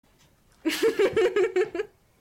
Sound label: giggle